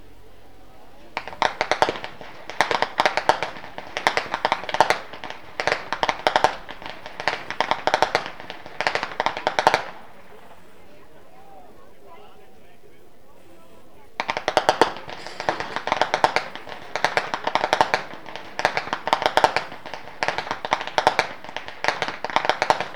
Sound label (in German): schnalzen, flachgau; steindorf